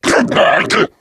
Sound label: charger lurk, voice idle; player; source engine; left 4 dead 2